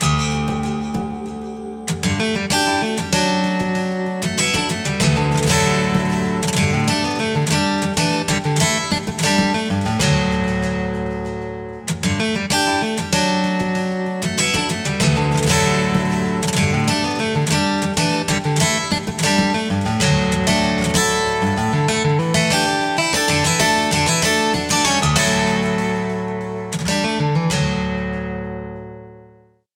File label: ringtones; LG; Smart Folder X100S; Sunshine